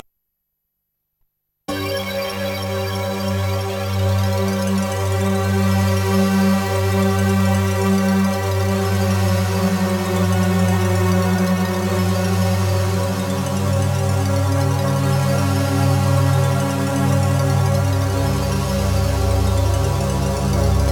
ringing a doorbell; Heatbeat; Trackers; Modland

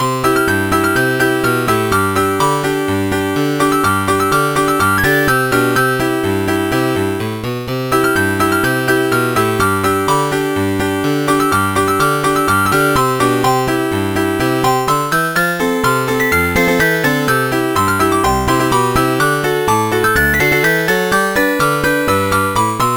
cute little old piano; TDK

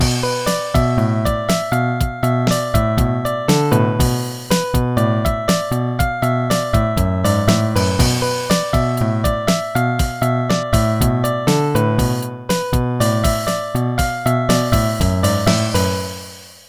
crush that beat; Master Tracks Pro

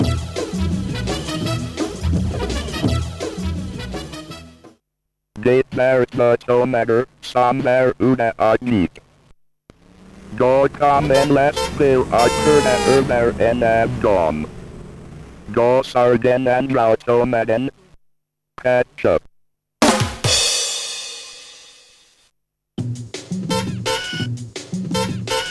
joke drum; Blumental

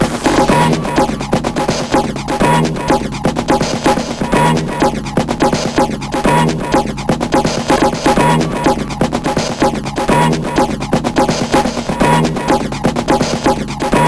hardcore drums; Trackers; Modland; Mutley

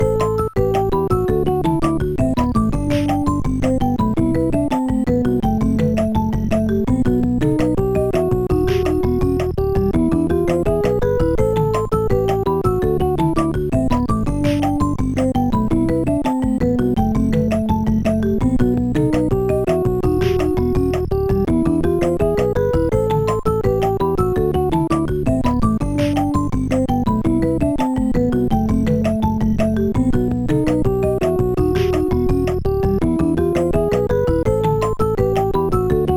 Samba; Instant Music; Rock2

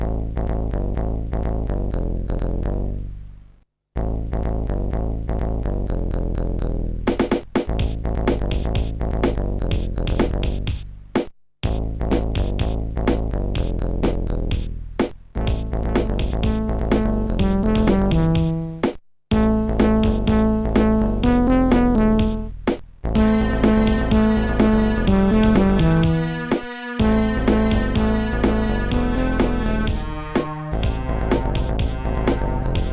brass

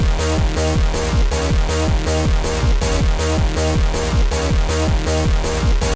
bassline techno; Trackers; Modland

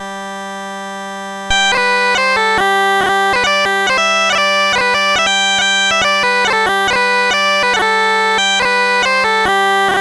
OctaMED

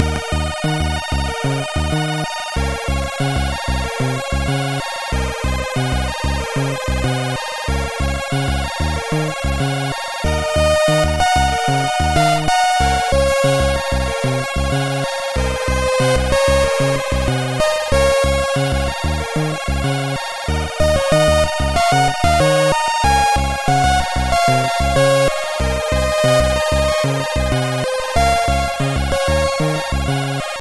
nightowl, chiptune

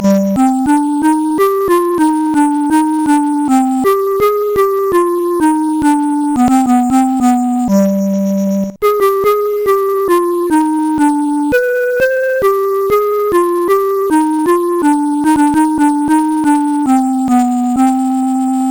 clarinet solo; Dylan Dog Looking Glass

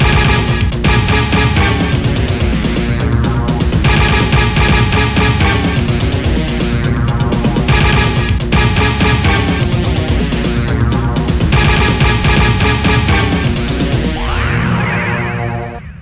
startup unused, Trackers; Rogue Male; Trackers Modland

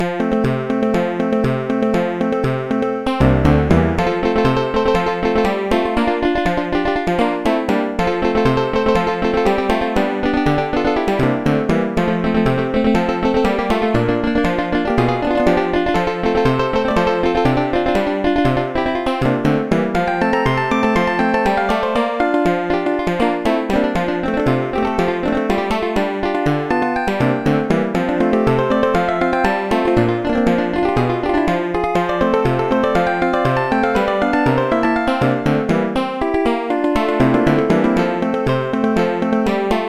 country; The Music Studio

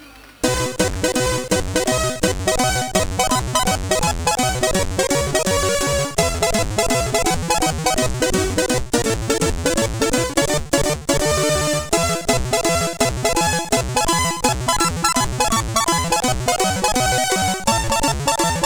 end screen, CSG8580R5; sid; Lemmings

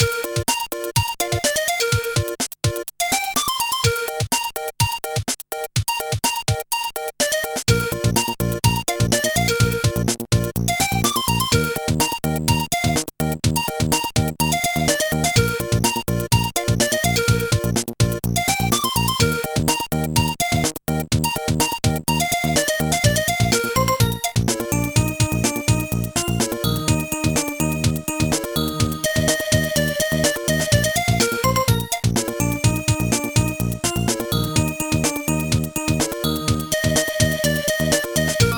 whistly tune; Wiz n Liz

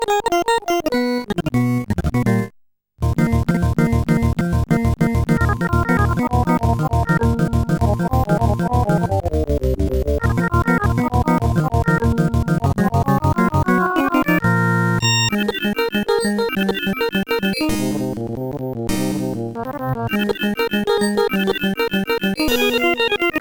cakewalk song; Music Studio; Mousetrap

